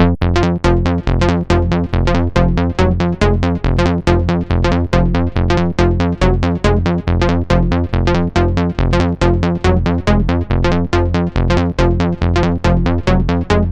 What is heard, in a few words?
Synthe Bass